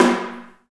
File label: HAIRCUTS, KENYA DRM, Heart of Africa